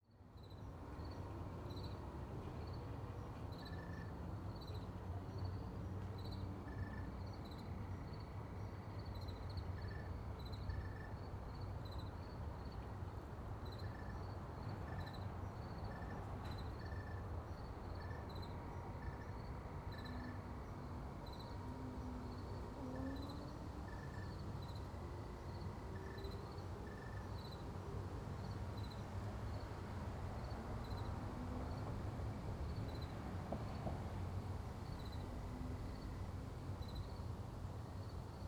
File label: Ambience Backyard Near Road; AdobeCC